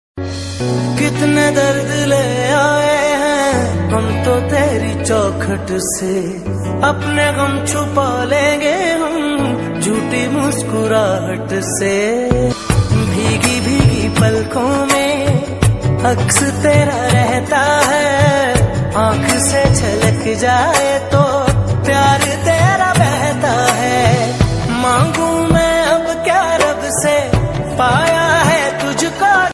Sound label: mood off ringtone, odia ringtone, sad ringtone